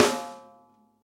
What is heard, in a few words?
Big Room Acoustic Snare Sound F# Key, snare sound tuned to the F# note